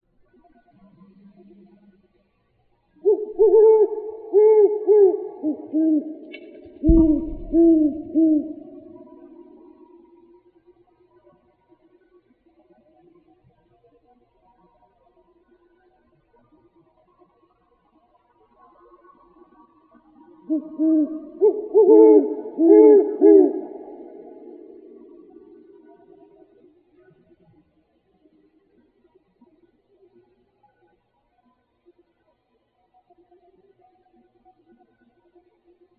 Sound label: GHOW, Owls; AZFO; deviche